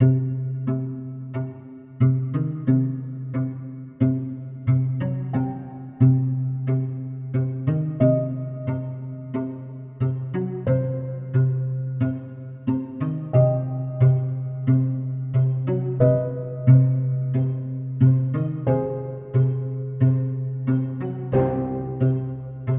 Subtle Intro

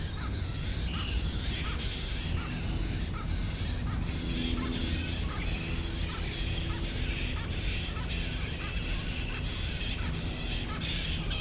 Bats

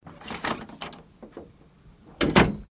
Door Squeak Open And Close Mix

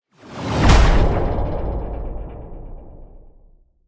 Riser Hit

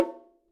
Bongo

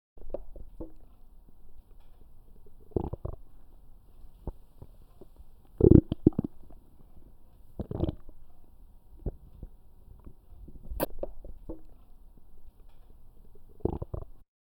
Stomach Noises

